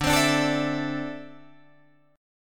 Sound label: D 7th Suspended 2nd, Guitar, Drop D